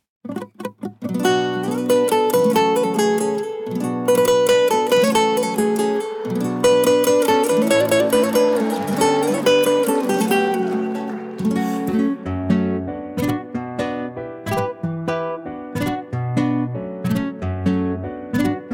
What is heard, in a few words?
happy birthday instrumental